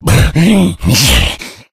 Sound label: become alert, infected; Left 4 Dead 2; Source Engine